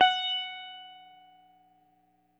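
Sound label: electric sitar hard; Quantum Leap - Guitar & Bass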